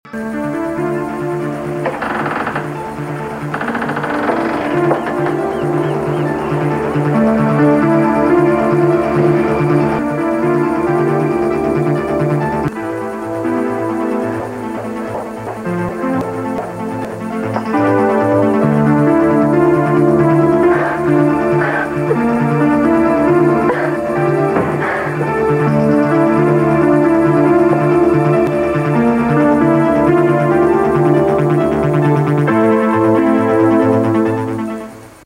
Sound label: dramatic theme uptempo